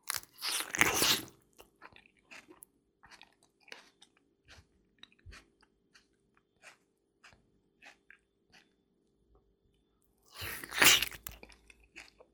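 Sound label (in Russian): man eats a tomato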